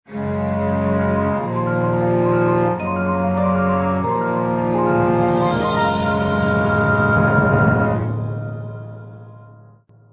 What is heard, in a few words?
maptheme; crumblinggate; Might and Mayhem